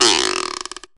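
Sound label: Cuica, Roland S50 Percussion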